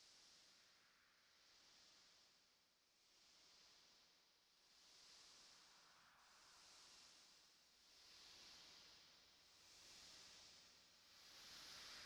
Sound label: White Noise